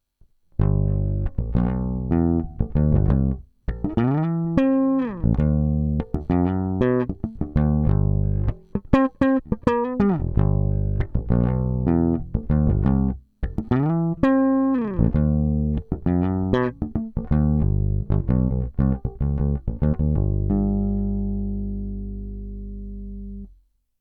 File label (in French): PB57 flats tona mid; LaBella FS